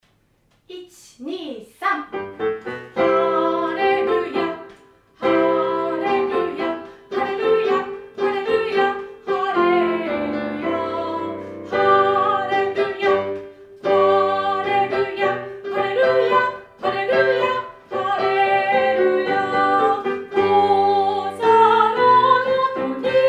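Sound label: Hallelujah mezzo-soprano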